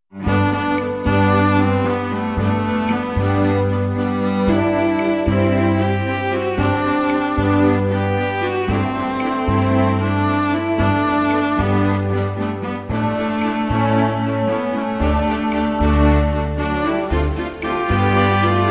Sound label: SD-90 Cello; SD-90 MIDI